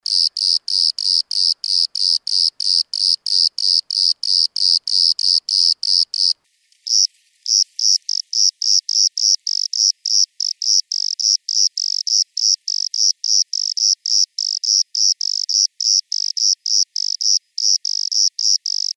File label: Eumodicogryllus bordigalensis; BIOAC ORTH